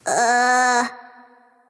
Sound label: nv confused; cupid